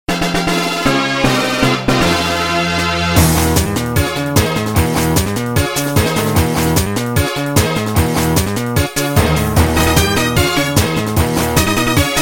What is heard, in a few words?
victory